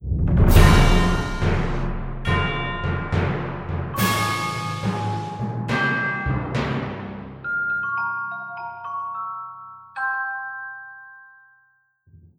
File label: Start round; Artifact